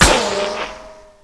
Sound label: cg metal; weapons; Tribes2